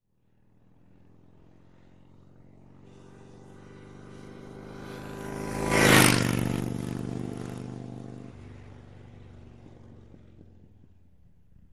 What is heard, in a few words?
Harley Motorcycle Ho, harley-davidson horns; harley-davidson ext horns